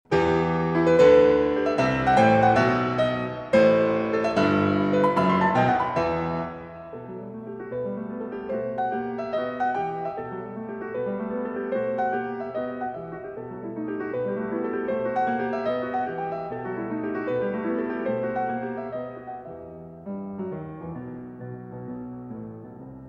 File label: Piano Sonata No. 30 In E; Op. 109; Beethoven; 2. Prestissimo